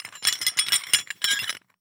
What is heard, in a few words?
Hole Saw Rattle, Hole Saw Bits